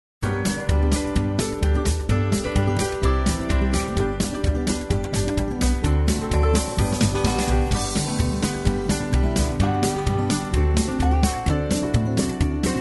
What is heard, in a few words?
no melody, square dance music